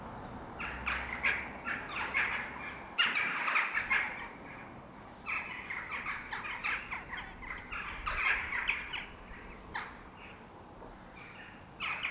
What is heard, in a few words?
Jackdaws